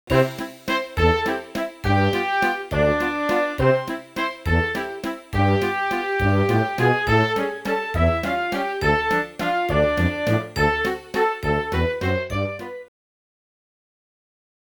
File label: ballgame Bat